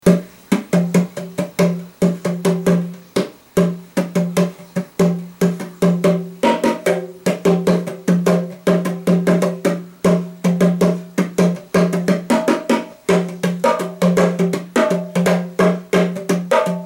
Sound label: Bongos